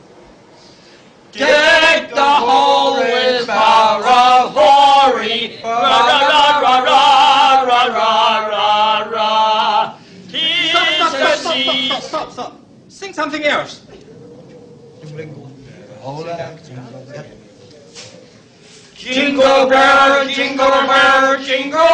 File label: fa ra ra jingle